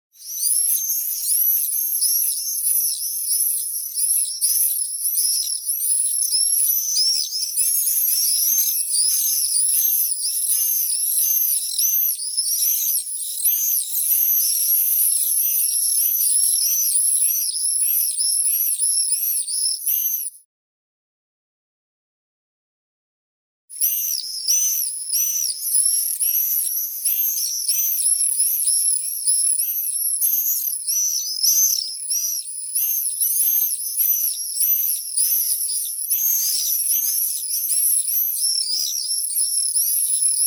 zvuki prirodi strizh chernii apus apus podrostok

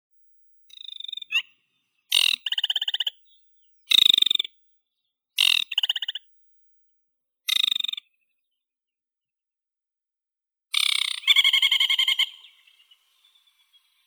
kamishovka vertlyavaya acrocephalus paludicola

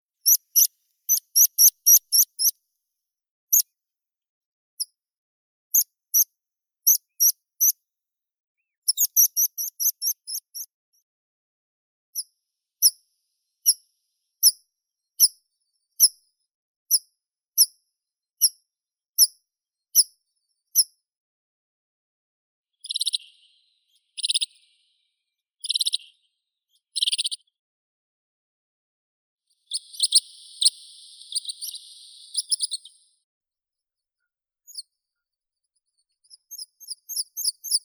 konek lugovoi anthus pratensis

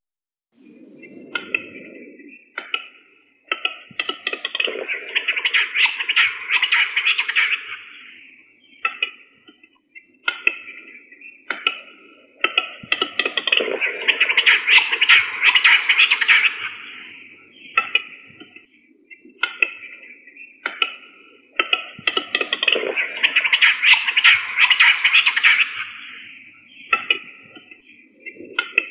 gluhar tetrao urogallus

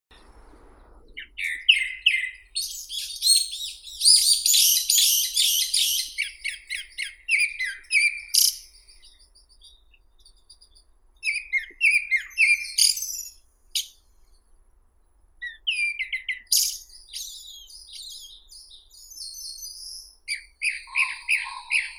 drozd sizii turdus hortulorum

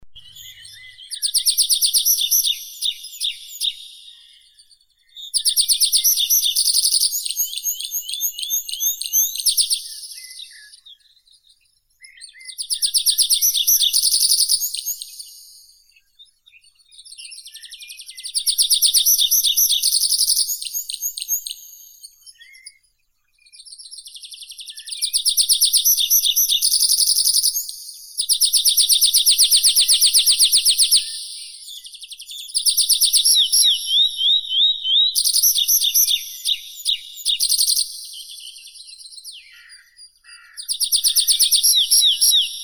konek lesnoi anthus trivialis

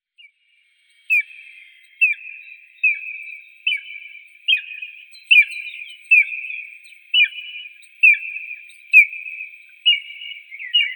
orel kamennii aquila rapax

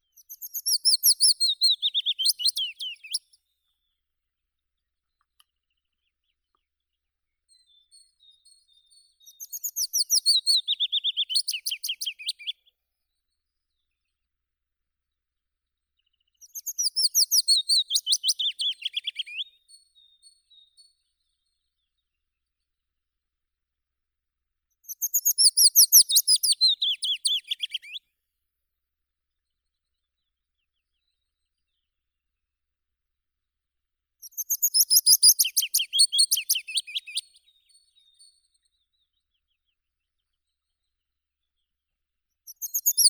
penochka vesnichka phylloscopus trochilus

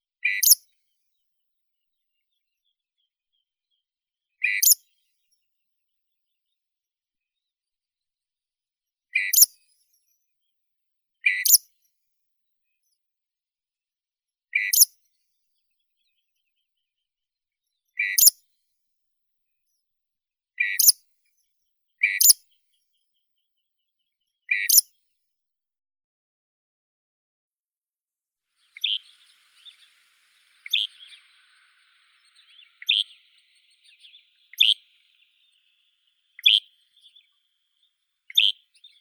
zvuki prirodi sorokoput pustinnii lanius meridionalis

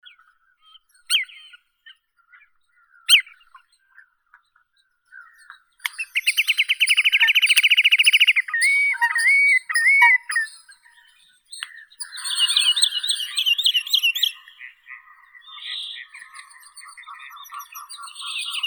porucheinik tringa stagnatilis; zvuki prirodi

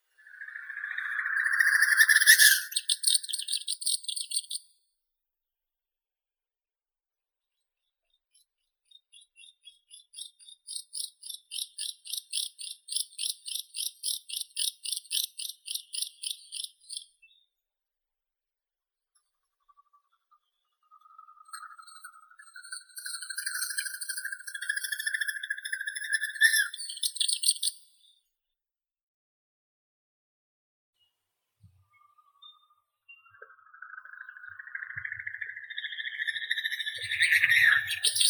dupel lesnoi gallinago megala

